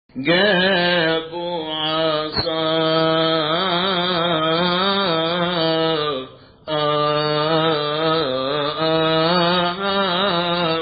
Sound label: Aspasmos Arabic; Feast of the Cross